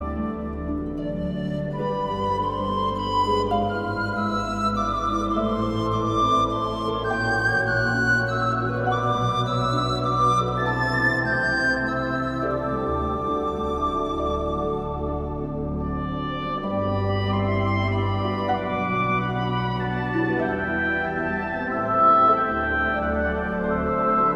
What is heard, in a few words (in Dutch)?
instrumentaal